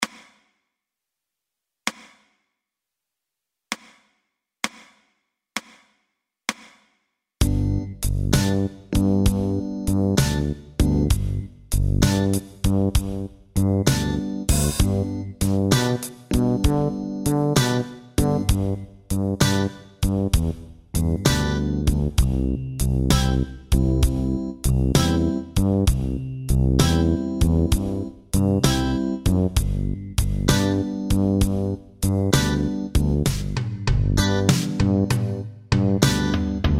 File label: Two String Boogie